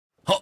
panda Poke; shangrila

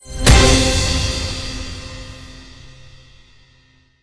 small Win; fortune rangers

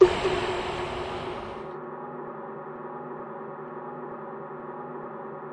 spin Button Click; neon staxx

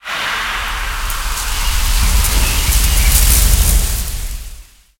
near Win; gorillakingdom

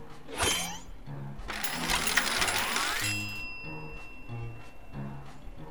multiplier Present; cashomatic

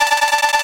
count up loop FS; rome

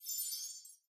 wild Transform Flash; wild worlds

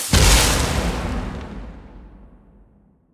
mega Win; goldengrimoire

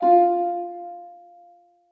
melody F4; fairymirror

